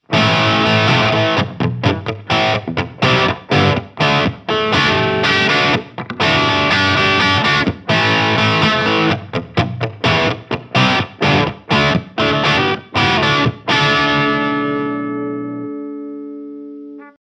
Solar Guitars A1 7C Crunch

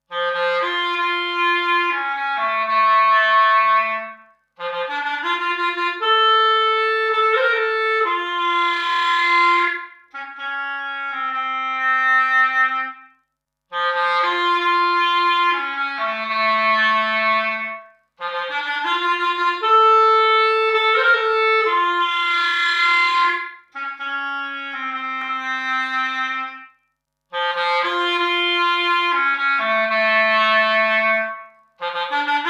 clarinet; logidy epsi; factories 100

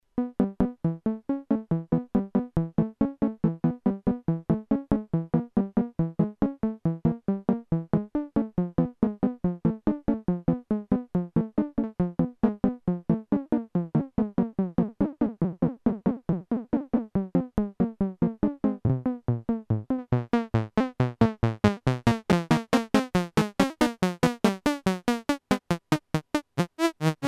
Korg Volca Keys Poly lead

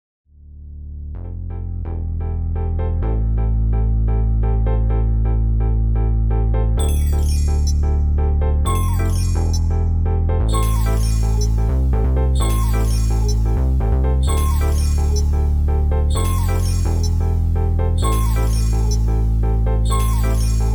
Roland MC-101

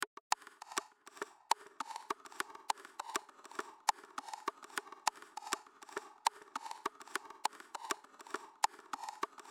Percloop M Delay

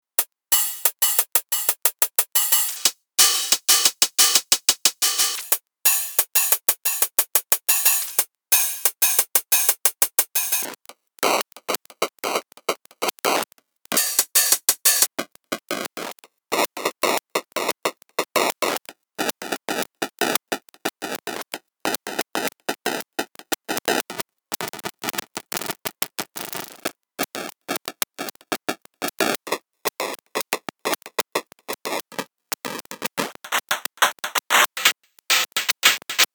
HATS FM AM Tune Accent

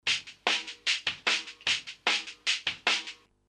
Roland S-330 Sampler Drumloop 15 kHz HPF

